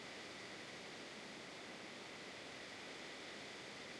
ambient; World of Tanks

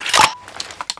reload clip out, foley; ranger; ModernWarfare

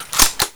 Inf Weapons Foley 3rd; Red Orchestra 41-45

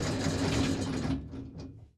engines; World of Tanks

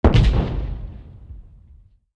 tank explosion far; War Thunder

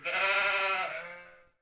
City Ancient Small Sheep; Ambient; Civ4 warlords

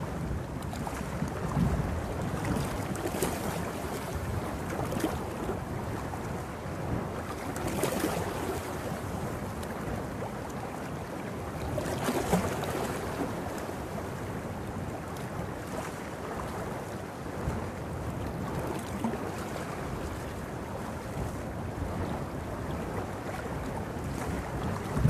ambient port default; World Of Warships

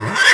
Horse Hit Vox; Civ4 warlords

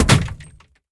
bullet hit inside

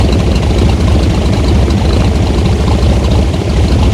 morava rear, engines; War Thunder

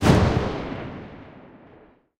mg 8mm besa lastshot

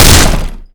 shutter burst; Modern Warfare

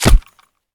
body armor close; Escape from Tarkov

